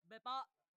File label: earrape